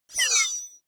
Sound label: sci-fi menu close light; energie